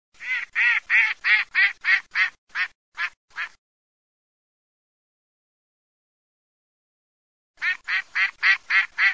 GERMANE

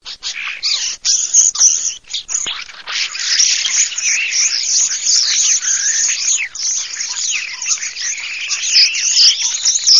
STORNI STORNI

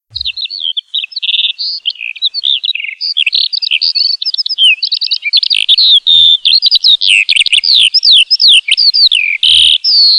ALLODOLA

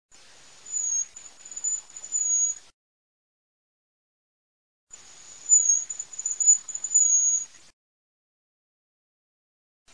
FRANCOLINO DI MONTE

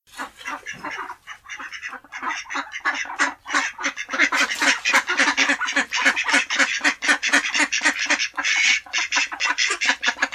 germano

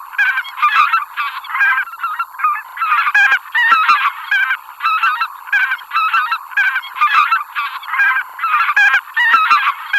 OCA LOMBARDELLE

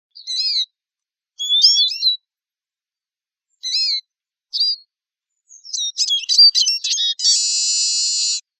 Lucherino

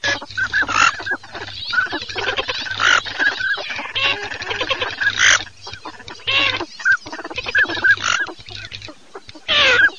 marzaiola codone moretta tab

